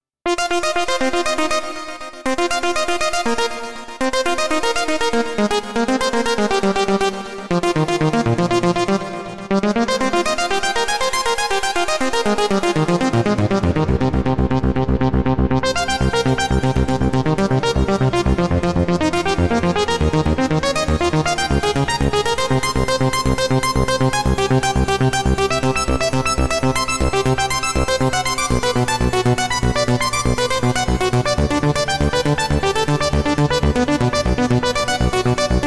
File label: arp; the transmitter soundset